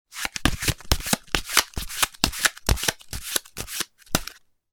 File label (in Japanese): カードシャッフル; board game casino mahjong